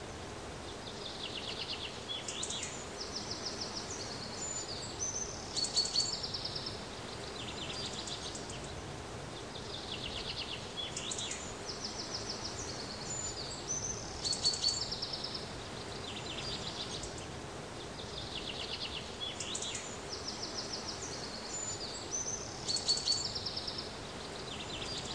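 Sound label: Scottish car wood morning; the 39 steps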